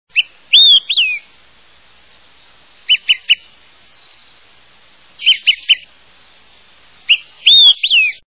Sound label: Olive sided Flycatcher